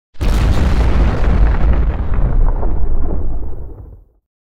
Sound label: Explosion Low Fire Destruction - Light Explosion; Hollywood Edge; sound designer's toolkit v2